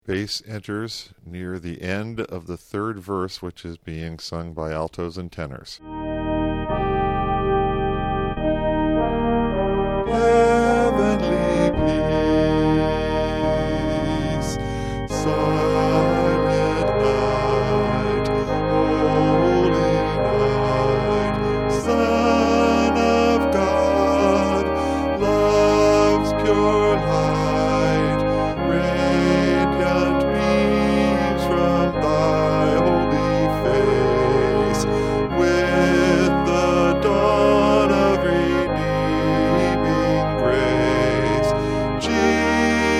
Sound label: Silent Night Bass